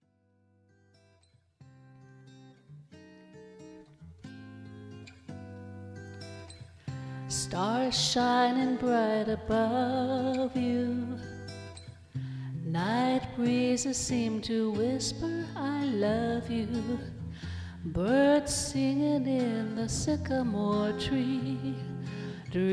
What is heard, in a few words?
Jazz